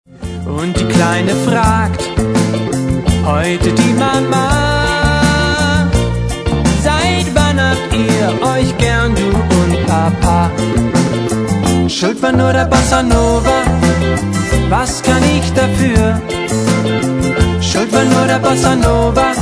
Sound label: Bossa Nova